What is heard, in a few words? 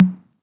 Tom Zephyr, 909 Toms; Slate; Vintage Drum Machines Volume 3 909 Edition